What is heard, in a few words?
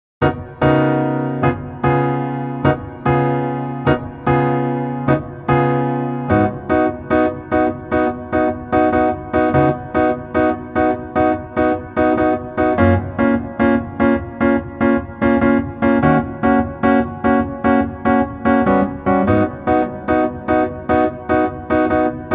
Piano